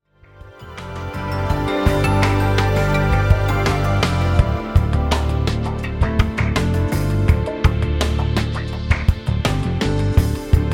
Lounge

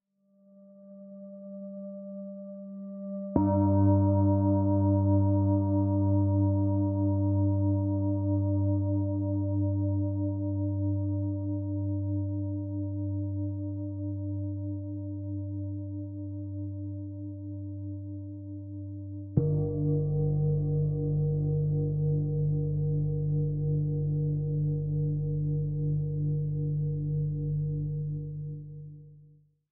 Singing Bowls Low Tone

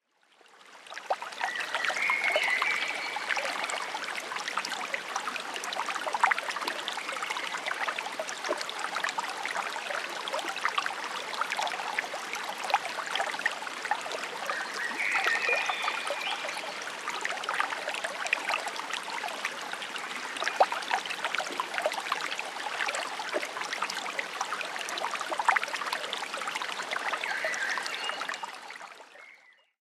Small Creek And Birds

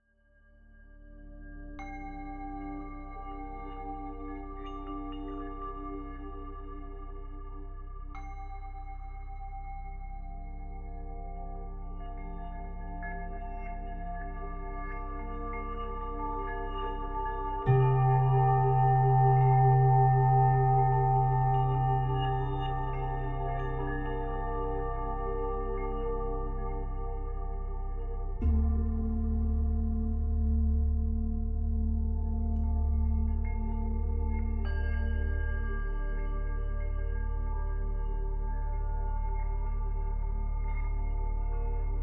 Deep Meditation 3D RELAX

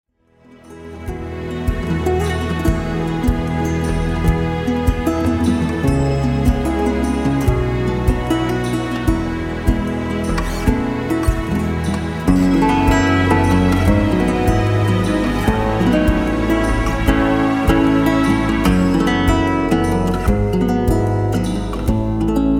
Lounge

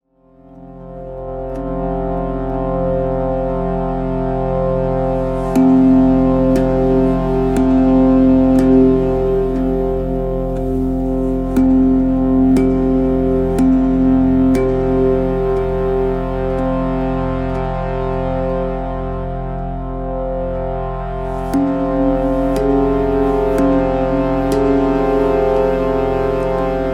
Shruti Box Journey

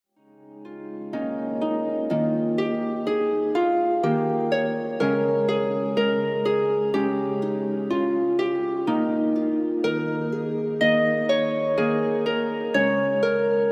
Baby Lullaby

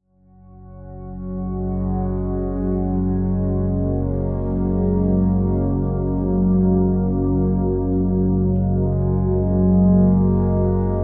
Deep Meditation

